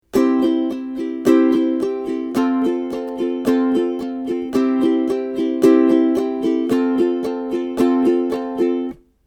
du DU du DU, ukulele

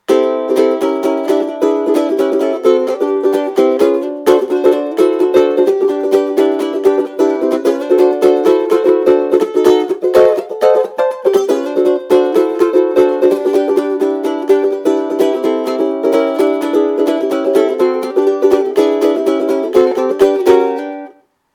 strumming, ukulele

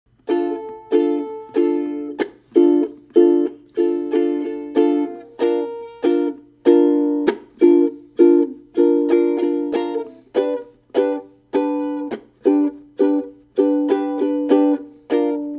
Intro Strum, ukulele

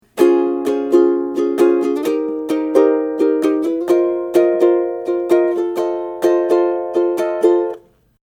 happy Strum, ukulele

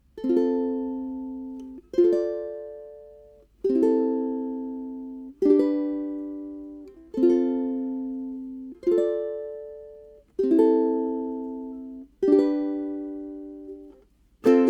Strum, ukulele

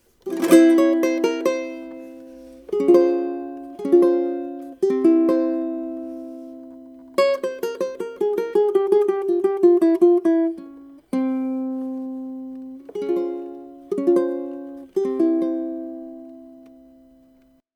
Flamenco Scale Example, ukulele

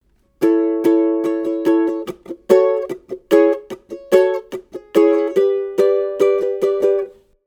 Chorus Strum, ukulele

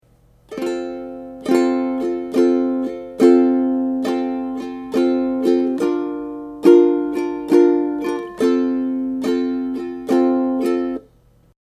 Strum, ukulele

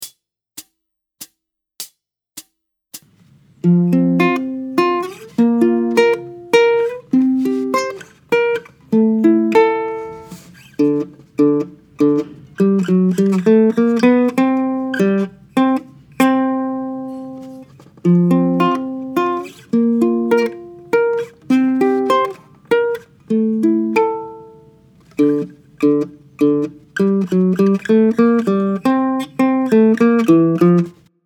Backing, ukulele

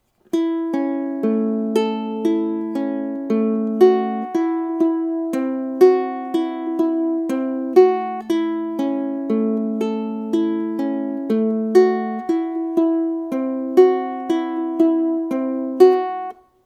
Picking, ukulele

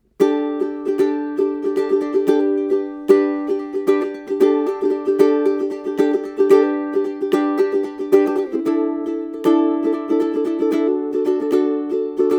Sus2s, ukulele

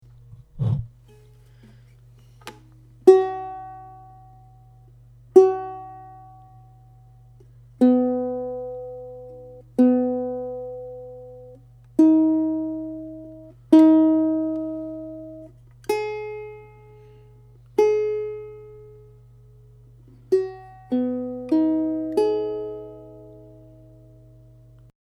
tuning, ukulele

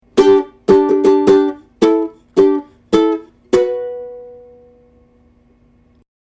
fanfare, ukulele

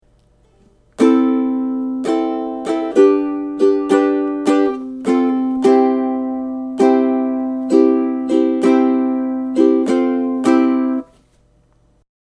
End Strum, ukulele

